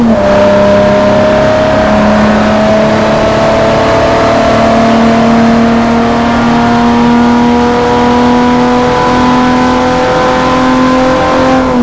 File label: third, vehicles; delorean